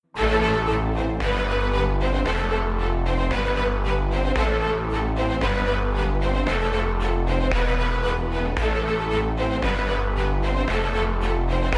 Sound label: Thriller Bgm, Malayalam; Vettah